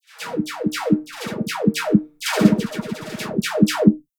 snare

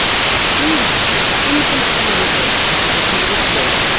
white noise w speech no ducking

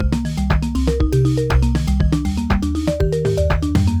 music unprocessed

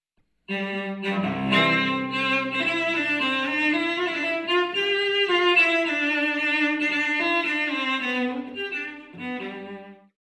cello Rev random decorrelated binaural

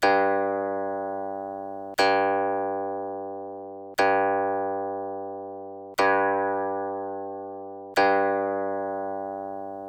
Building Up Guqin harm inharm phant

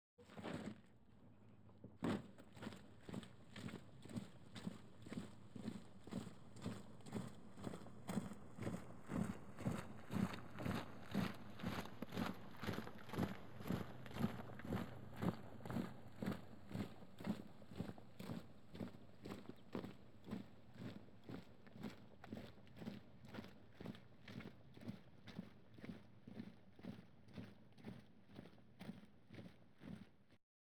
march Right To Left Bin

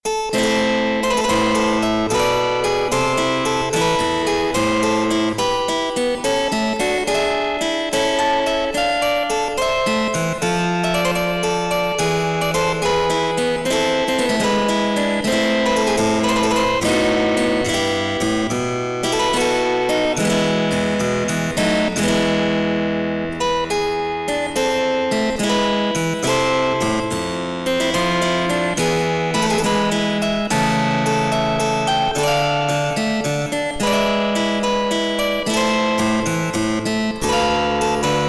harp distortion high; antiquing

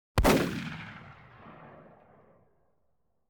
shotgun Blast

